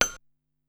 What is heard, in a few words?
Noise Vibe, Korg Wavestation SR